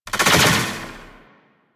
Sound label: Roulette Activate; Warframe; Cowgirl